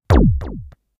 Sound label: laser; kenney digitalaudio